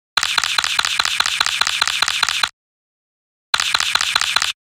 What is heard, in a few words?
camera burst capture